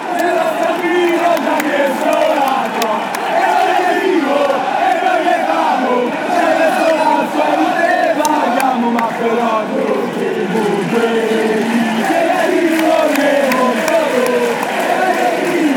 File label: lazio chant